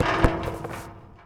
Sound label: Street Ball Metal Basket; SFX Kit